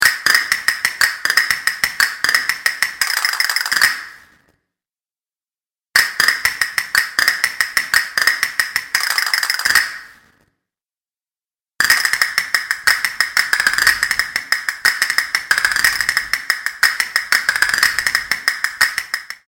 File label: castanets